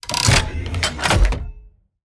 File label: power engine run